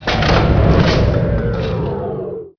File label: flatten; mcv; Emperor - Battle for Dune